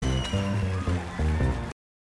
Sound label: Instrumental live